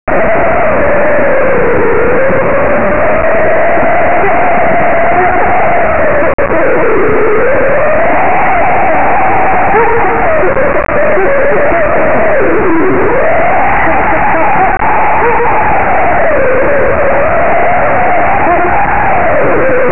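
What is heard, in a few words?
vocal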